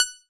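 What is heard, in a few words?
Old Banjo DX100 F sharp 6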